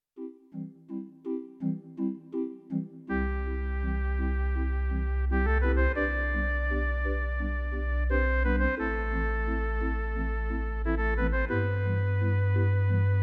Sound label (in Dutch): Kerkorgel, roland v accordion church organs